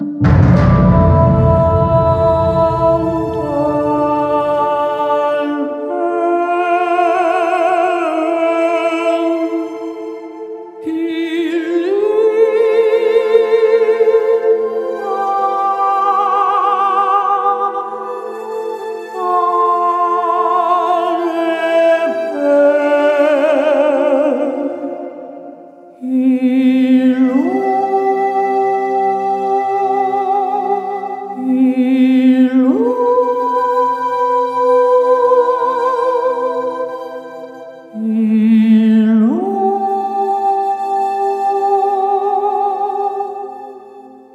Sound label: Defeat; Armello The Bandit Clan